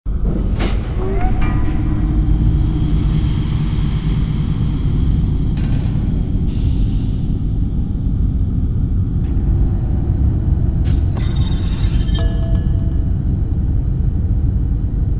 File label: Pirate Constructions, Ambient; rayman 2 remastered soundtrack